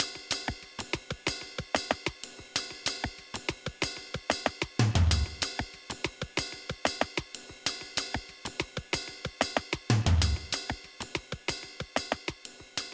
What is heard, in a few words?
Lose Loop; 2010 dsiware; Aura-Aura Climber; Sky Jumper Sol